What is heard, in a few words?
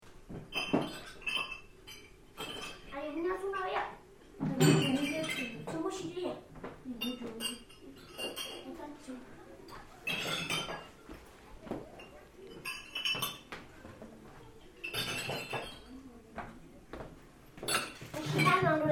butter lamps, Nepal